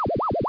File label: Rize Line; SketchBook 2.1